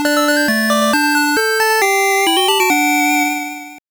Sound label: Level Clear; marko s magic football; game gear